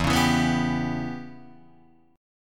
E Minor 6th guitar chord, Open D

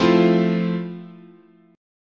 F Major 7th, chord

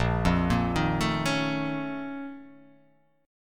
A sharp Minor Major 11th, A sharp, down

